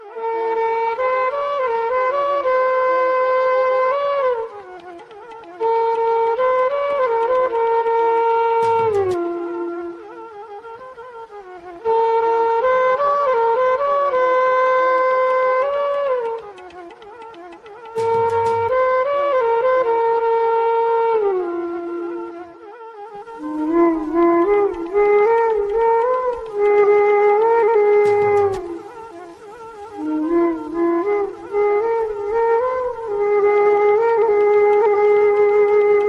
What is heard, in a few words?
Flute Ringtone